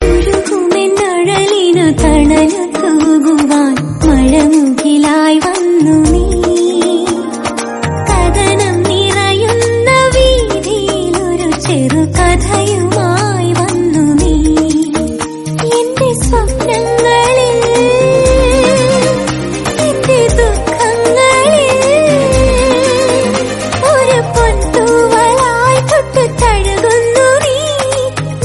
song; chathurmugham movie

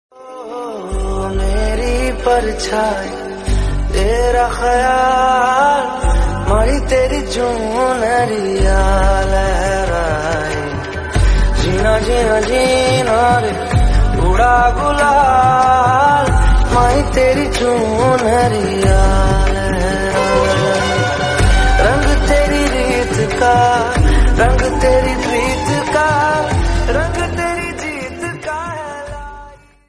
sad hindi

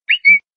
bird whistle notification tone

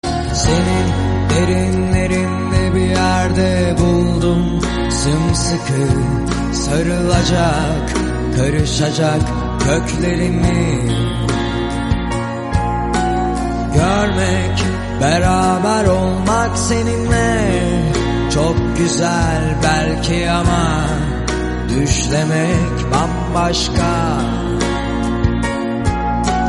song turkish

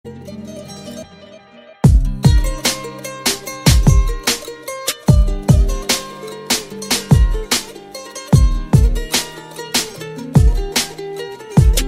turkish saz beat

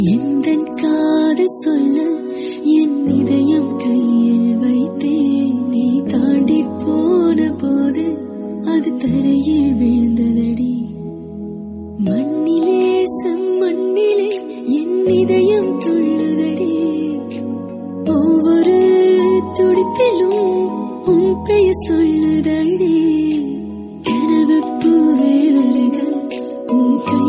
female version tamil song

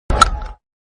sweet strike of the cricket bat

